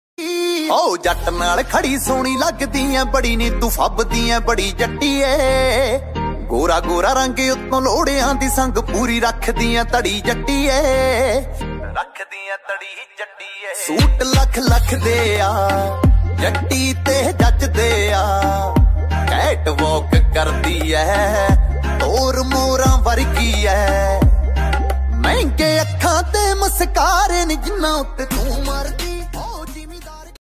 Punjabi Tune Ringtone

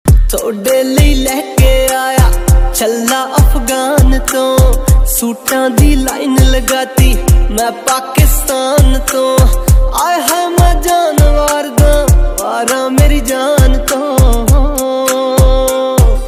Punjabi Beats

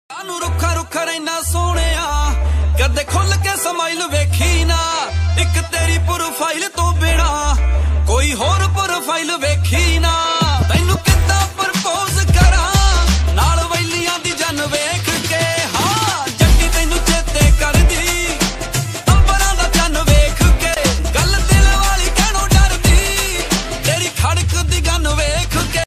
DJ Punjabi Ringtone

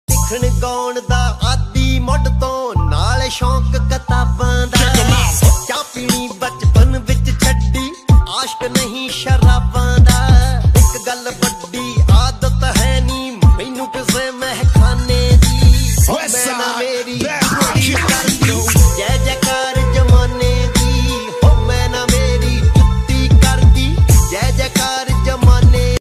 Punjabi Tune Ringtone

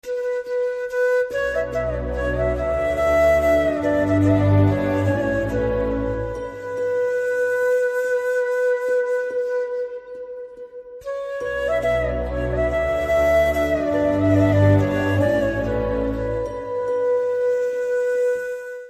BGM Telugu Ringtone

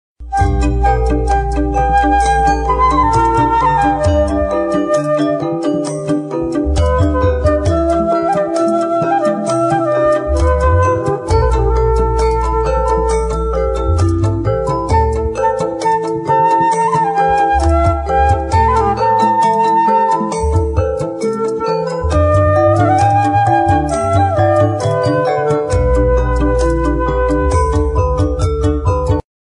Harmonically Rich Ringtone

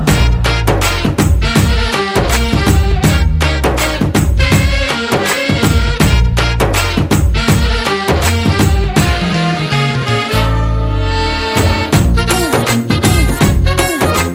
Drum Beats Telugu Ringtone

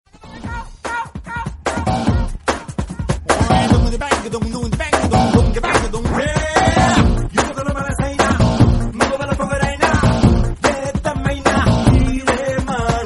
Telugu Ringtone

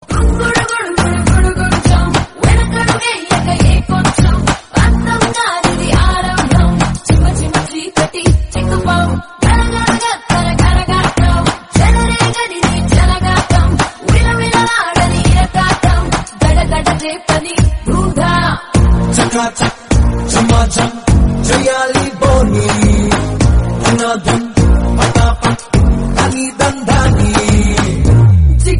Telugu Ringtone; Oosaravelli

